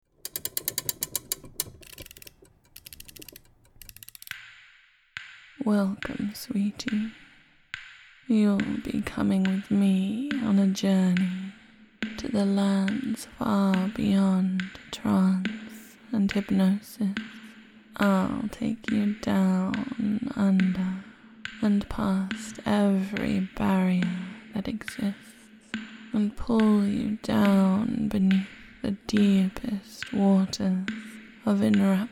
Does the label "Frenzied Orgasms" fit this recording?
Yes